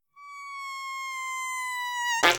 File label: squeak fart